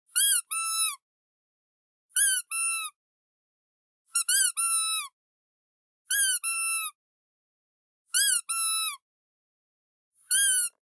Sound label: Duck Toy